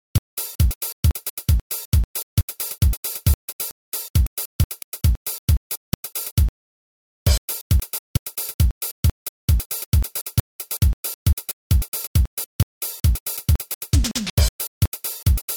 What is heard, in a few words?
drum test